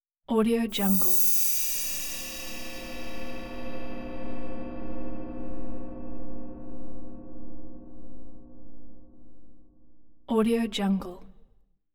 thin suspenseful accent; hollywoodedge

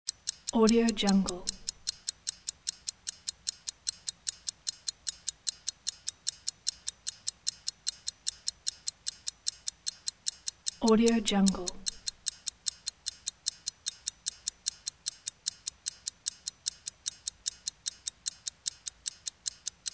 clock ticking

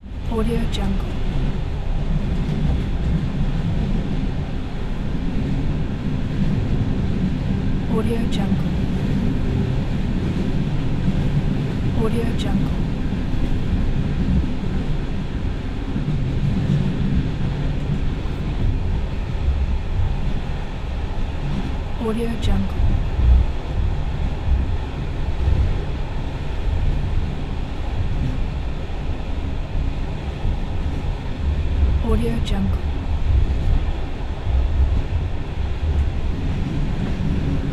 train inside carriage

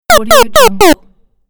pixels impact fail

